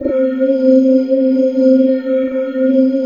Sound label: pad, 160 BPM, Drum & Bass; Ueberschall; Drum & Bass Flash Trackz